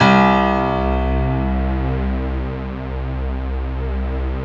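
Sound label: piano strings; Best Service Gigapack 1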